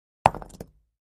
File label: Patio Block Hit Sledg, concrete & brick